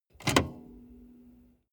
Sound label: car bmw e46 interior seat lock in